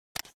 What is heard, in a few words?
shutter mirror down; LG VELVET 2 Pro